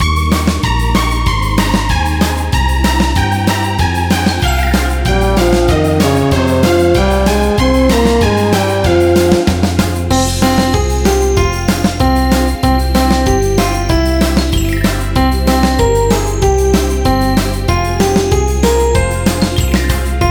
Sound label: karaoke